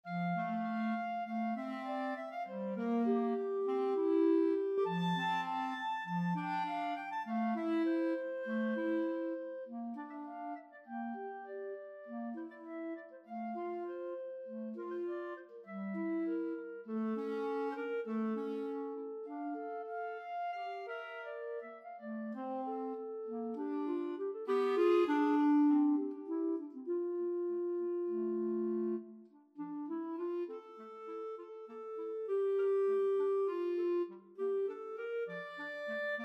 chanson de matin clarinet duet

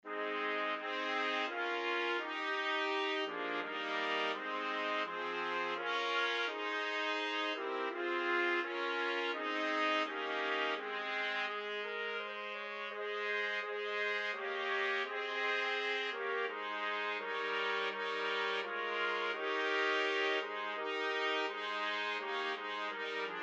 god save the queen, trumpet quartet

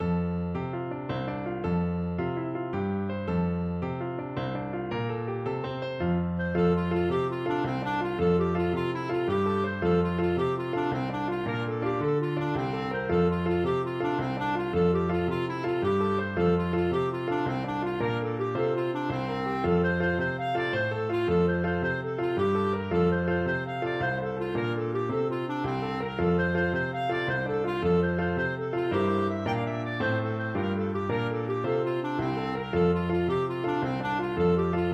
jig, clarinet

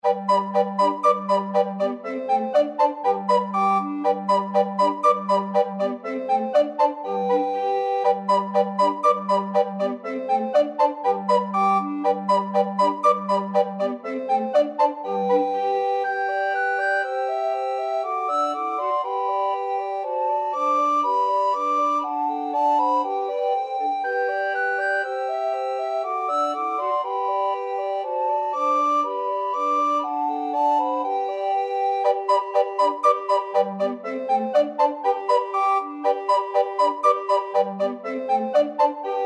ecossaise, recorder quartet

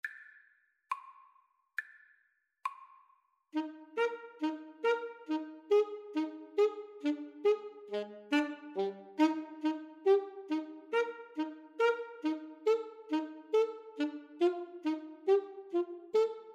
schubert rose, Alto Sax Trio

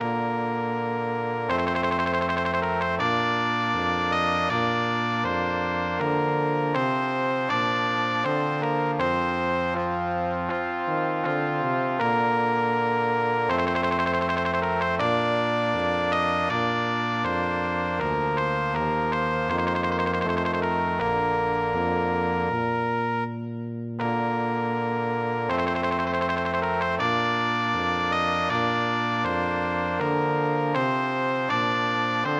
trumpet voluntary, brass quartet

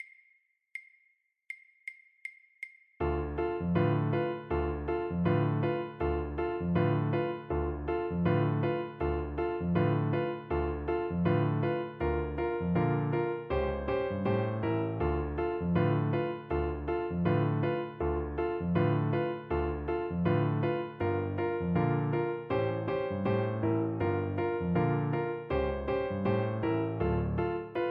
move to the left, Viola

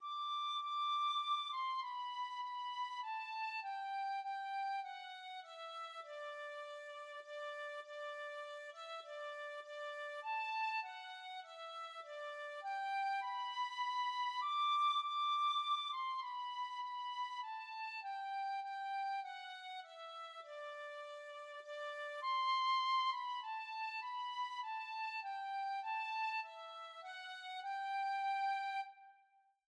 away in a manger, tin whistle

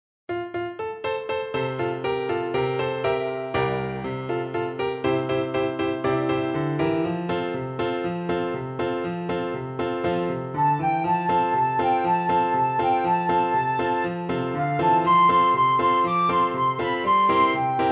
flute

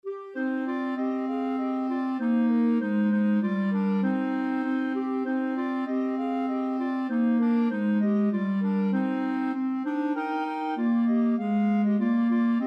clarinet trio

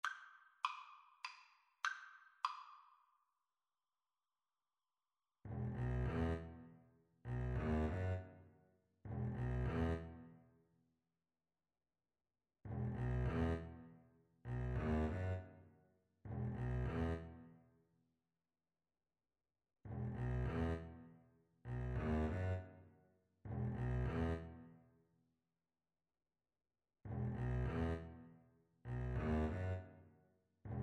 Double Bass Duet

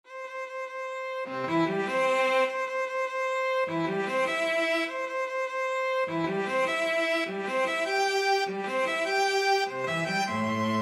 2 violins cello

